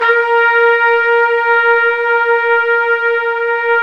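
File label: BRS TPTS, brass trumpets section mp; Roland LCDP06 Brass Sections; Tpts Sec.1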